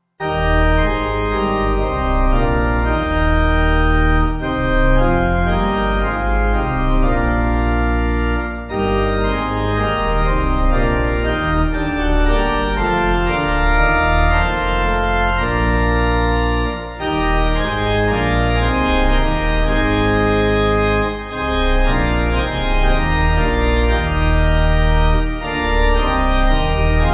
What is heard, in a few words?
The Gifts Christ Freely, Pipe; Denby